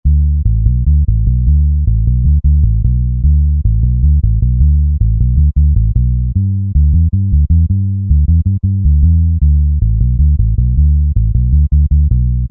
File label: Old Soul, Bass & Drums; Roland SR-JV80-10 Bass & Drums